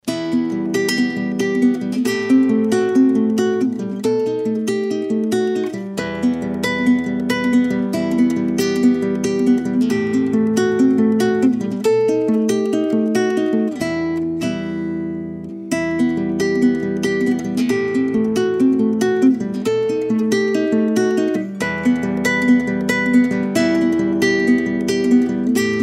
Classical Wedding Ceremony, acoustic guitar; Esudio en Mi Menor

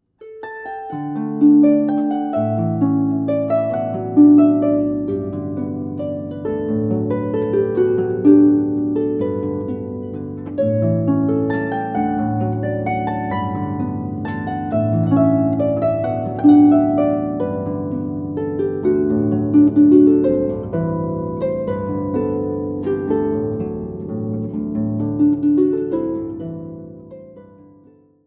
Harp

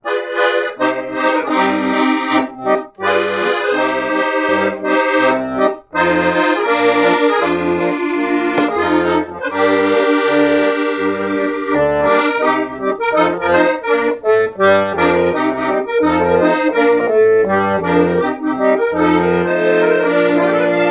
accordion

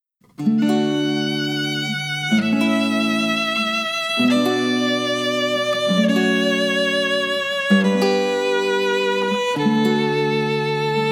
Canon In D, classical guitar

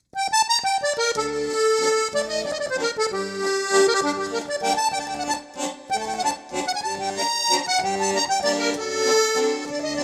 Italian, accordion

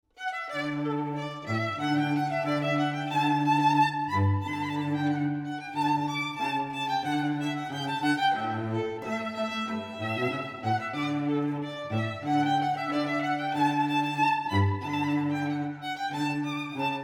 violin cello duo; Mouret Rondeau

